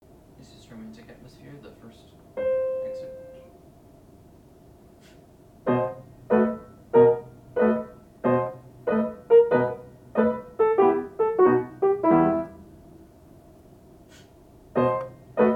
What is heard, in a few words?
Romantic Atmosphere melody